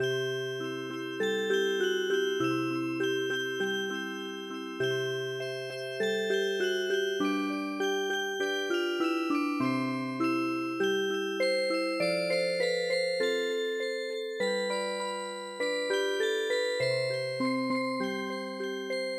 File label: unpluged Music Box; midi; traditional